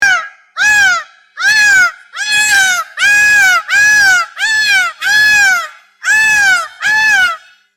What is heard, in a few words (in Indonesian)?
Sfx merak